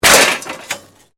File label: METAL CRASH; Materials